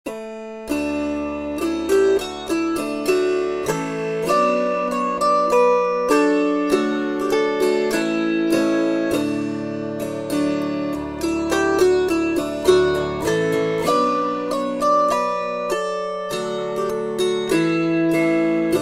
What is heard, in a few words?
Harp